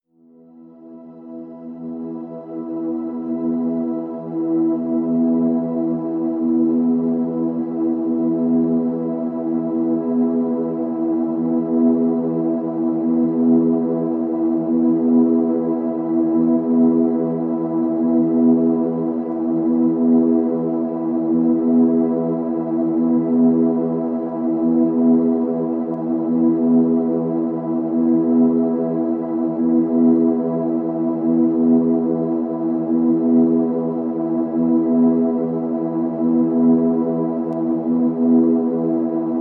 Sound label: pads sublime e